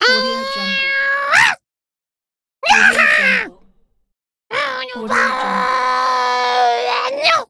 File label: wacky fun monster